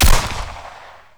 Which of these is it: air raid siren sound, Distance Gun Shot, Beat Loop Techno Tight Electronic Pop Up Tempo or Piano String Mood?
Distance Gun Shot